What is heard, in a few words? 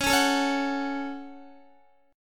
Db Suspended 4th Sharp 5th Guitar 4F,4A,5C,5G,5C,6E; strummed